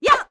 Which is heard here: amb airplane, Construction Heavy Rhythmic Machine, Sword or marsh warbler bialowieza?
Sword